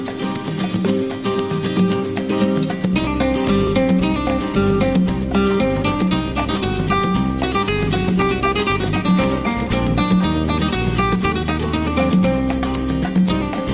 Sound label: guitar ringtone